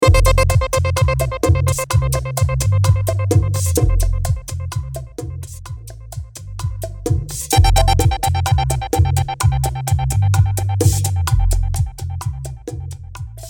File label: Intro Outro Break 128BPM